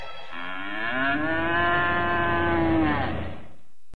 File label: cow; Animals